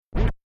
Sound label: Turntable Scratching; DJ (Sound Effects:Musical)